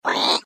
enemy shoot; Danger Mouse - Ultimate

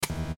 Catch a Scary Item; Scooby-Doo - Barrel of Treats

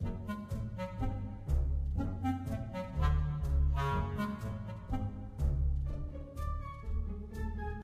music ambient forest loop; run-dorothy

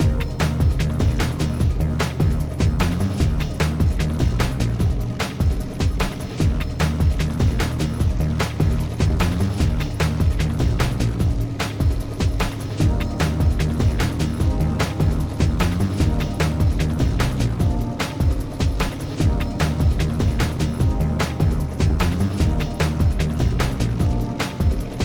bgm ingame; Wacky Races - Sling Races; NextlevelGames

